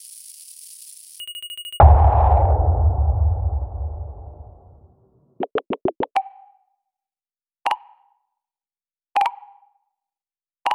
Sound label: sfx; contactDx